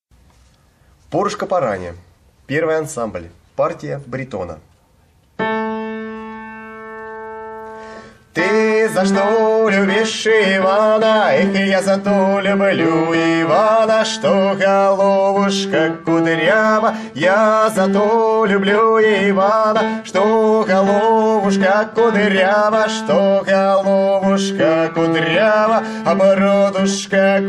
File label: bariton; Porushka porania